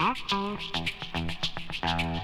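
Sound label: guitar; ZERO-G; Datafile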